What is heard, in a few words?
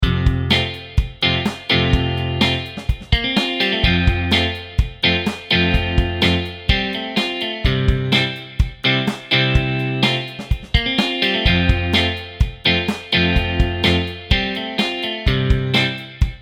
No Melody, ukulele; Rainbow Ukulele